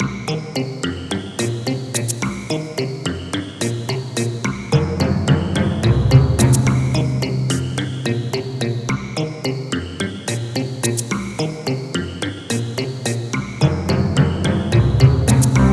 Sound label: Lair Loop, Music; Turtle; TMNT